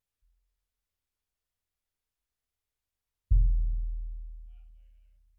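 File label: Bass Drum In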